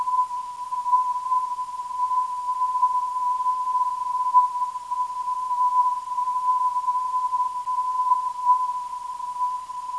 687.60kHz, NDB

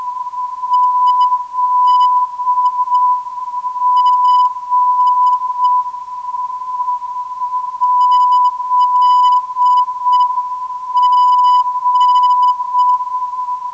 ZG 445kHz, ndb

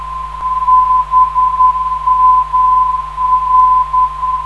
404kHz, ndb; BMR

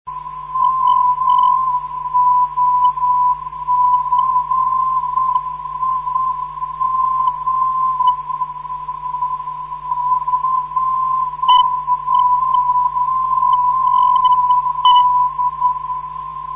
pod 335khz, NDB

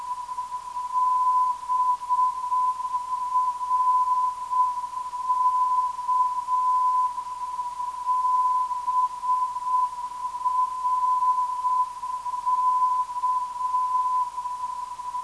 379.61kHz, ndb; BRK

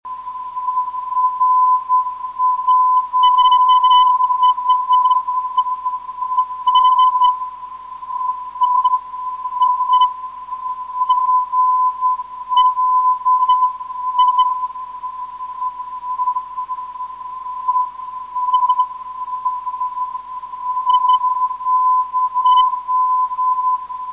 322khz

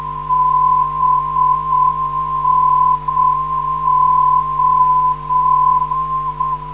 368kHz, ndb; BNO

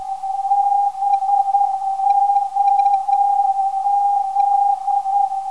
310kHz, ndb; BOZ

